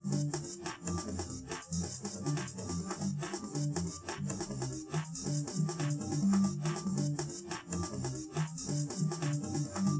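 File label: with drums; SiSEC10; underdetermined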